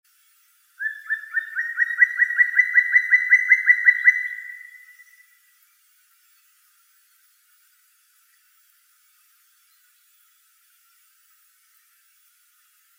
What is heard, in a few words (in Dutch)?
Zwarte Specht Dryocopus martius